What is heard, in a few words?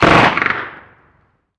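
gun miniuzi medium; ModernWarfare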